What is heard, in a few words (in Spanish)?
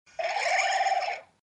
Grito de Quilladin; Gritos de Pokémon de la sexta generación